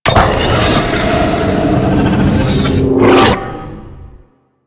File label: Shockwave Death Orb Raise, Traps; Warframe; Orokin